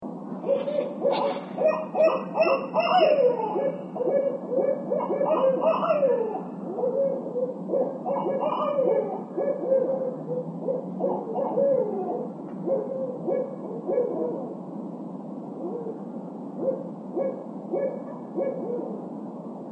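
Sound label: Barred Owl pair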